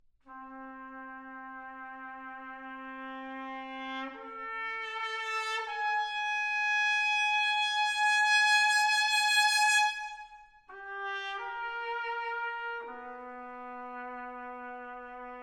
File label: Quintet for Brass Instruments; Atlantic Brass Quintet